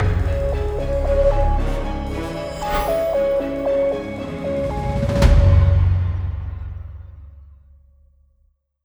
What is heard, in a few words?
break musical